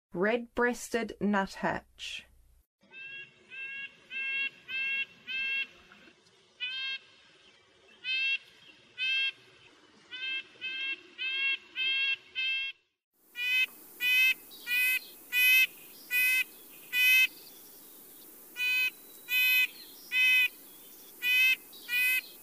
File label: Red-breasted Nuthatch, Songs of Birds of North America; Western USA; Western USA Songbirds